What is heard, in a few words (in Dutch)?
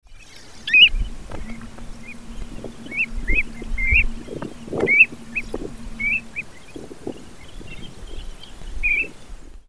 Bontbekplevier, trekroepjes; tijdens de vogeltrek